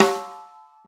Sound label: deep snare single hit g key; snare drum samples